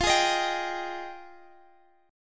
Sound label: F Minor 9th F